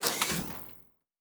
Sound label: Door, Sci-Fi Sounds